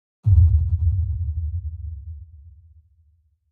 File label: LFE Grimy Spread Hit with LFE, Phase